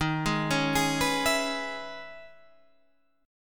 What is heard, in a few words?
Db Minor 7th Eb